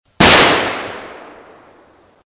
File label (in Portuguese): barulho alto disparo de arma